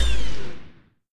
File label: Gattling Laser Fire End